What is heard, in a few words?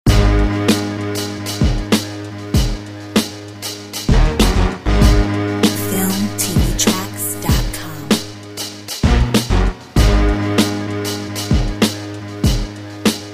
Hip Hop technique